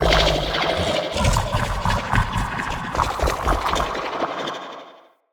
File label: Corrupted Slug Sub Boss Vocal Idle; Creatures